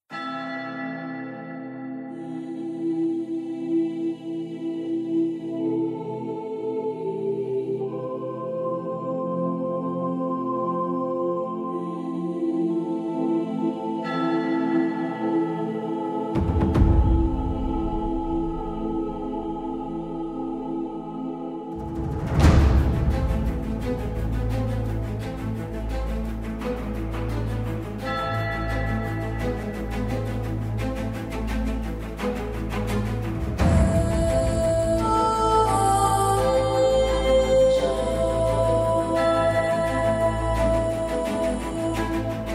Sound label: Epic touching dramatic powerful orchestral choir